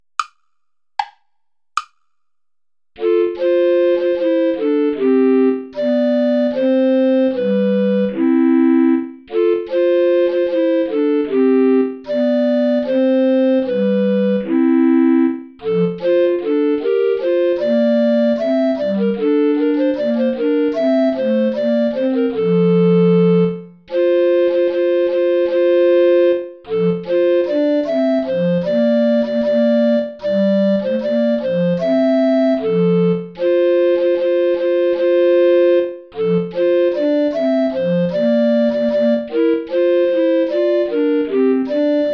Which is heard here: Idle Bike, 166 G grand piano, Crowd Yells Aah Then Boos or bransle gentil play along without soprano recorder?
bransle gentil play along without soprano recorder